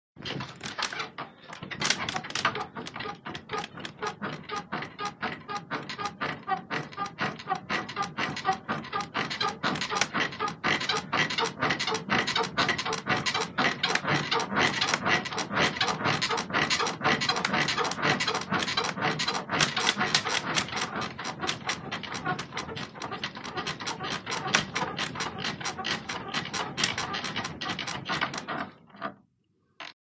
HOUSEHOLD BED: INT: Creaky old wooden bed, building in volume, violent creaks towards end, steady rhythm.